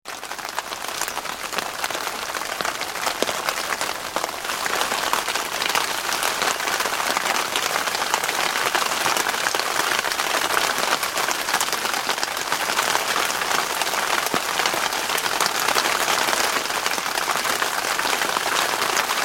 Rain On Plastic, Raindrops falling on plastic shelter.; Weather